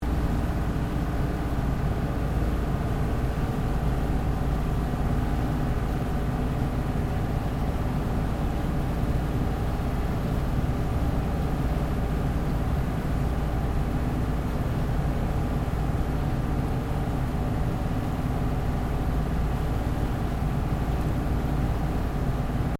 BOAT WATER TAXI LARGE MEDIUM LOOP, Large river water taxi; Williamstown; Boats (Sound Effects:Vehicles)